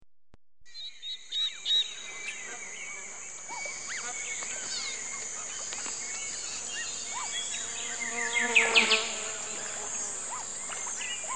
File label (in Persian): Tabiat